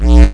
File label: Enemy In; Glider PRO Demo